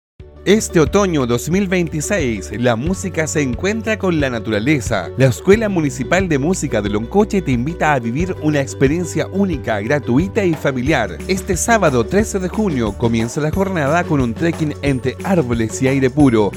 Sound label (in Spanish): CONCIERTO; BOSQUE VIVO CMPC